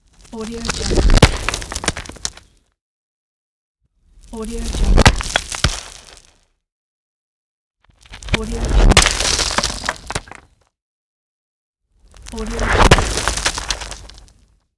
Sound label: fall and stone strike